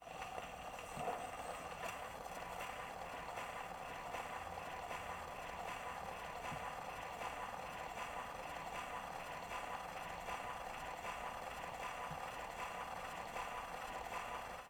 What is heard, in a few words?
Victor Victrola Playing A Record End Loop